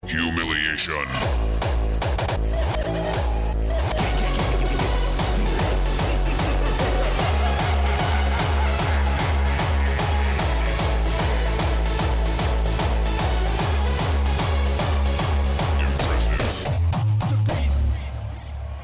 Hardstyle

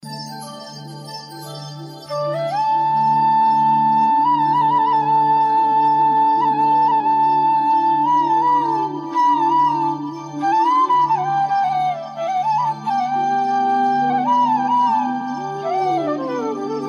Classical Flute Ring

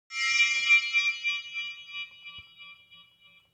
Short Mystical

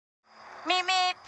meep meep

